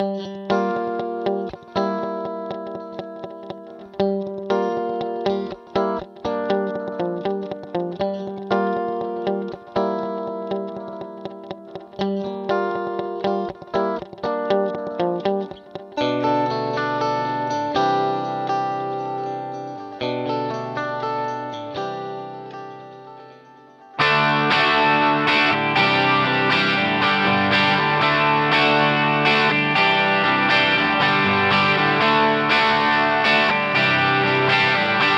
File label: Guitar Yamaha D5000 Spaced Pairs; Vintage Digital